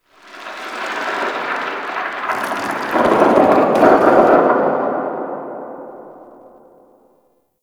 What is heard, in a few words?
Rock Fall Cliff Distant; Warframe